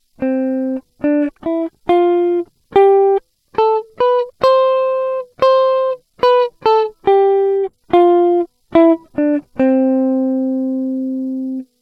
scale example; C Major in another rhythmic pattern

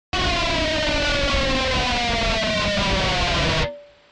1 String Pick Slide; guitarist

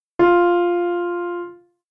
easy melody start note

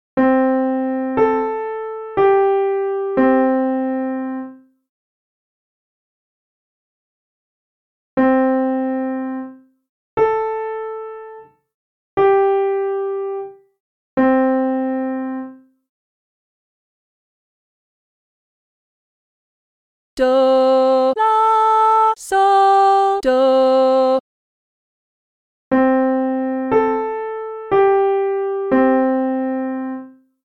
Medium Solfa Melody; Medium Melody 3: Hint

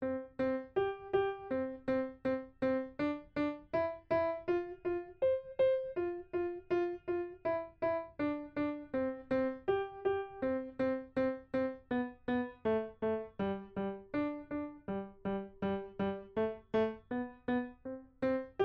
piano

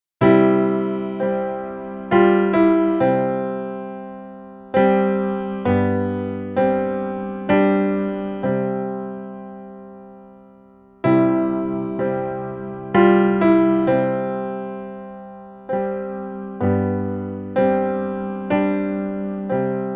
Melody with Implicit Beat

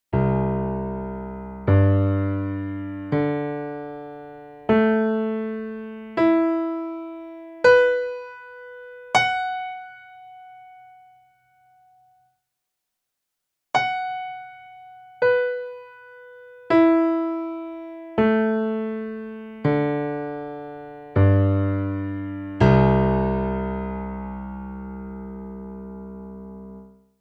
Lydian Scale by Fifths Outgoing Ingoing

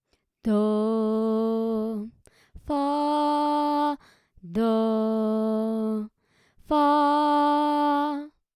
Warmup solfa

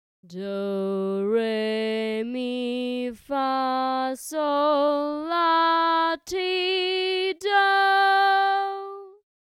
solfa scale

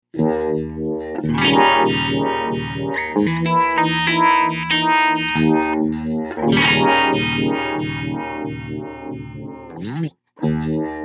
uni vibe clean

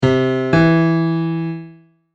Interval M3

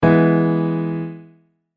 Chord

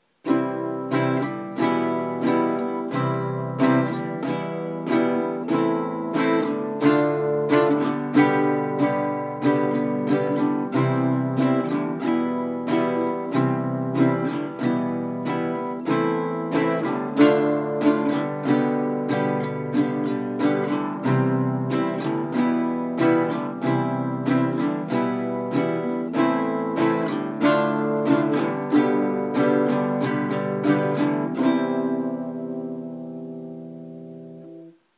Progression for contrast; A different chord progression

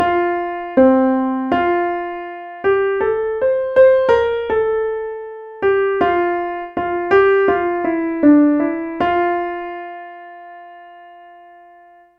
melody

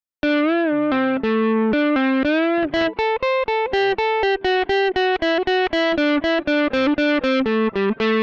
Dorian Mode Ear Training

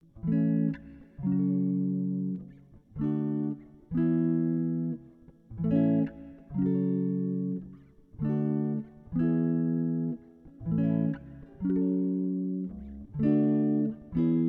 I vi IV V guitar A

